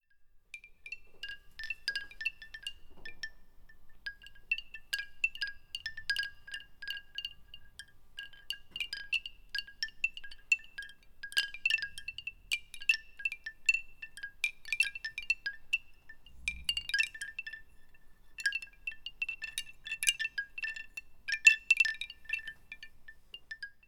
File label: Flotante Bambu Nature